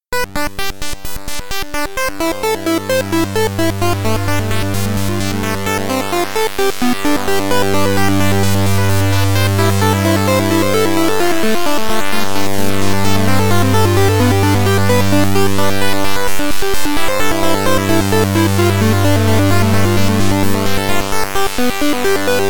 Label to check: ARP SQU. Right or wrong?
Right